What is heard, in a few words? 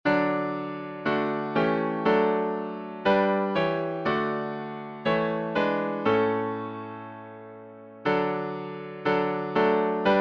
Hymns